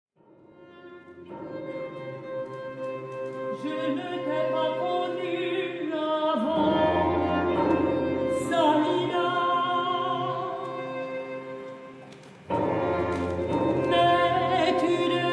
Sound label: Lullaby